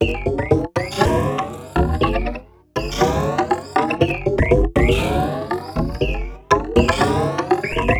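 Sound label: Loop, beats; Sonic Foundry (Sony Creative Software); Esoterik Beatz; Mecan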